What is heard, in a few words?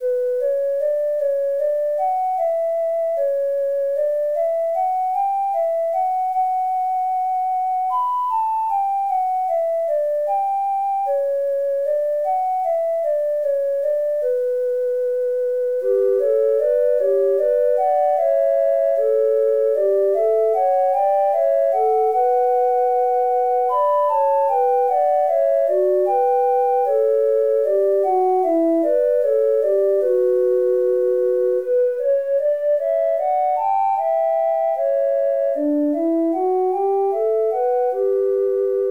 duet